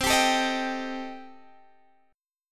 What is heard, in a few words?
C Minor 6th C Guitar Standard; Cm6 strummed; JGuitar